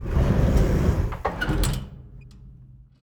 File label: Elevator Open